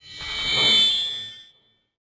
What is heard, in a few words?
feedback fade in